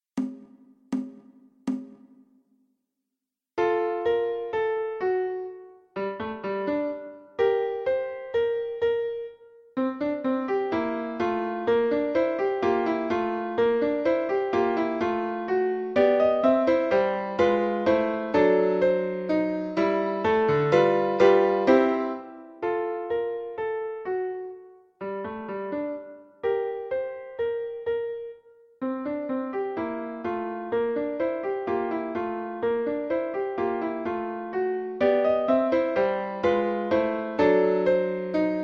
accompagnement seul; schumann robert; mai lieber mai

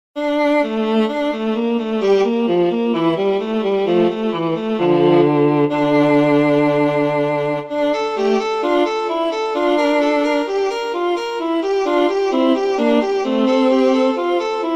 petit duo en re pour altos

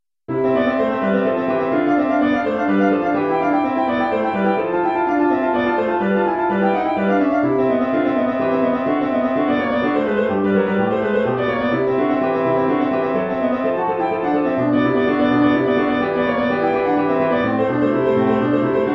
etude velocite piano

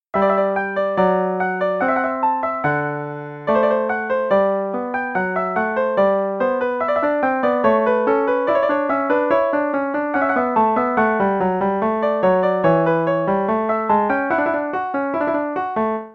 gavotte sol majeur

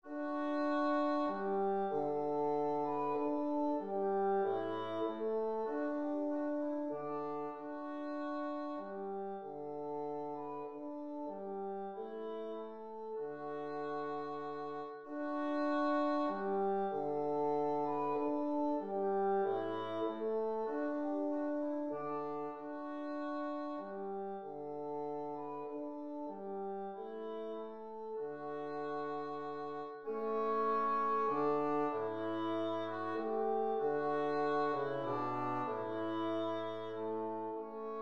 menuet majeur; lully jean baptiste

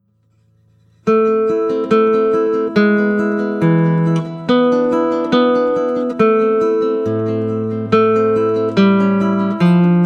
etude mineur

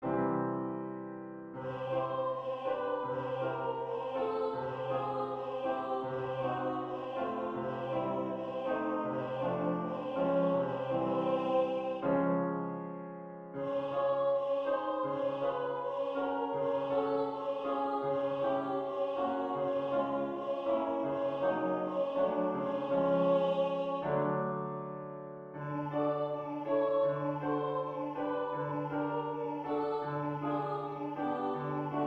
mise en voix echauffement vocal exercice vocal vocalises

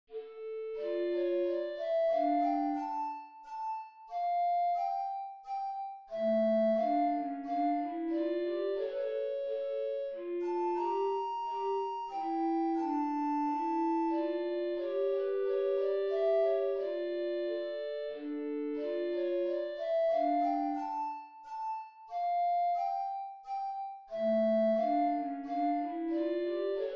menuet mineur; lully jean baptiste

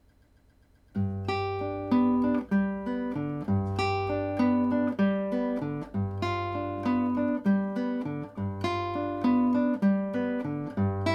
suite violoncelle; Johann Sebastian Bach

